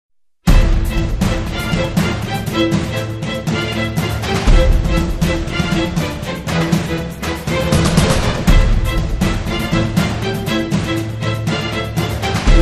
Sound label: Strings